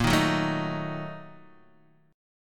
Bb Major 7th Suspended 4th Sharp 5th, Guitar, Open D